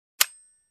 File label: Pistol hammer click sound